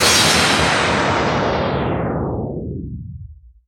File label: FX Impact; Vengeance Samples